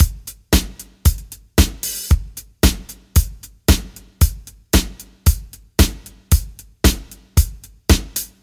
114 bpm breakbeat sample d key, Free drum groove - kick tuned to the D note.